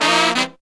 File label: 132, Pop & Funk Brass